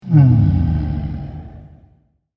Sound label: Fantasy Effect Ogre Yawn; Monster Sound Effects